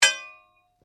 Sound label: Blade Heavy Moving Impact, Sword; Foley sounds for melee weapons; Swords; Combat